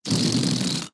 Farting Baloon Puff

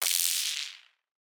Sci-fi Energy Gun Blast

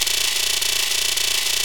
Loopable Mechanical Malfunction Rumble

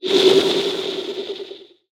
Oscillating Sci-fi Transition

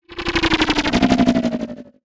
Oscillating Sci-fi Transition

